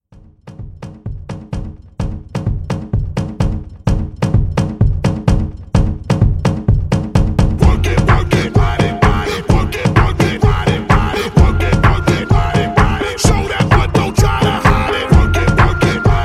Tech House Redrum Hype

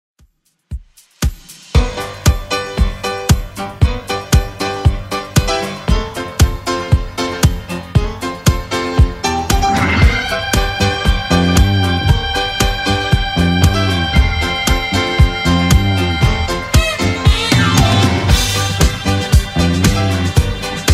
70s Redrum 116bpm

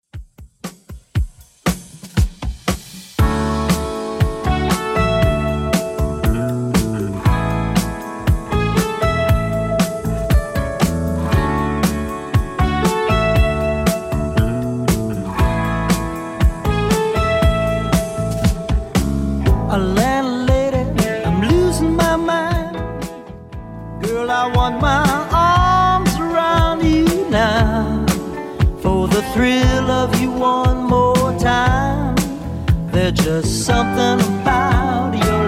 80s Redrum 118bpm

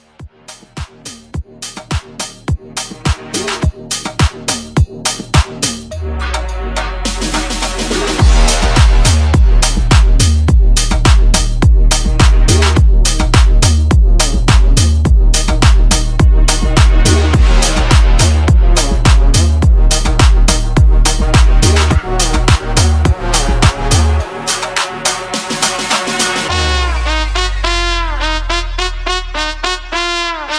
Transition 105 130

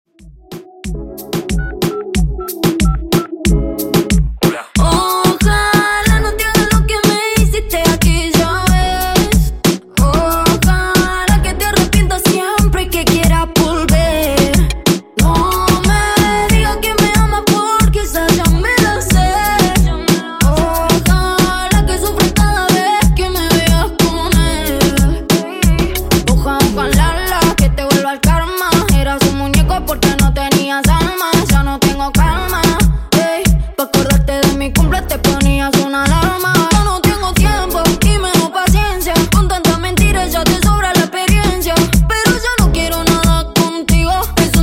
Latin Reggaeton 92Bpm

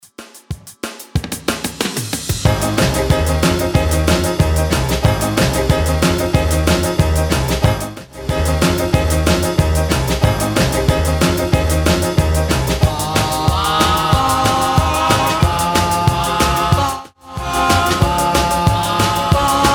60s Rock N Roll 185bpm

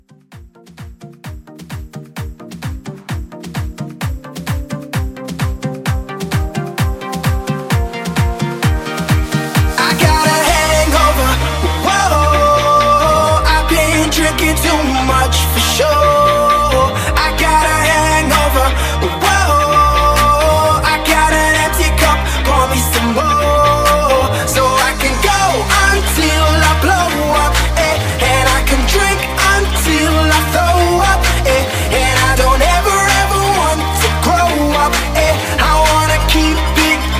Dance Tribal Bootleg, 130

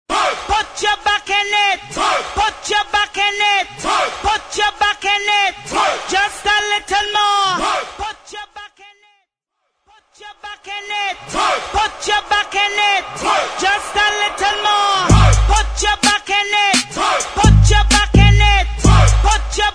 Acap In

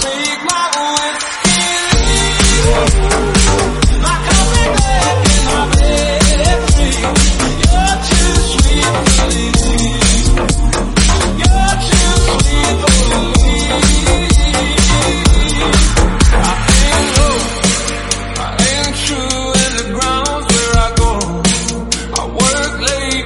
Snippet 126bpm Bb

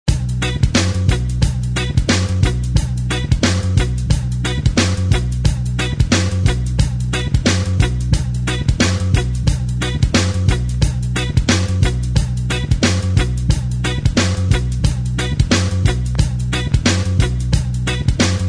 90s Rock; DJDOLLS; remixplanet

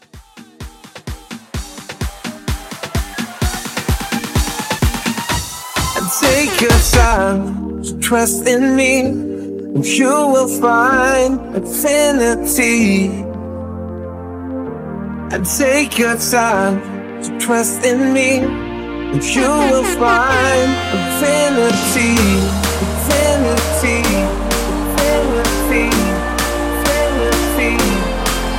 Latin Trompeta 128

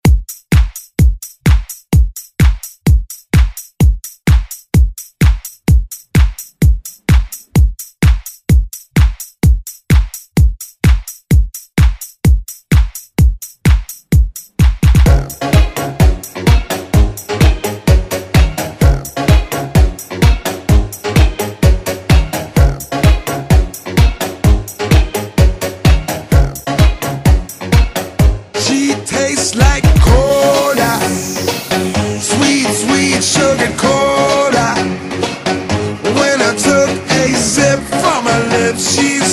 Dance

